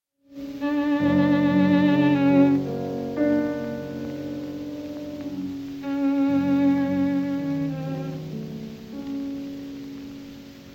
sonata no3 in c minor op45 for violin and piano allegretto espressivo alla romanza; allegro animato